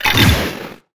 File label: monster skeleton death